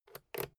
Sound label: fader slide click quick